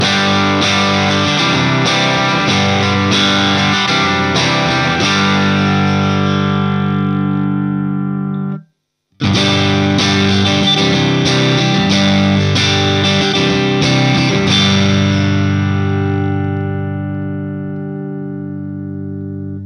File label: jtm45, tele pickups; sheptone tele pickups